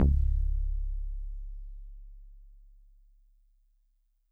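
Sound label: Solid Bass DX100 C#4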